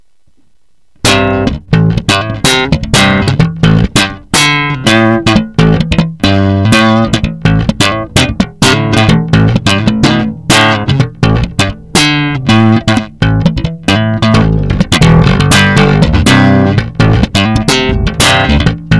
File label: Active PU Neck EQ Full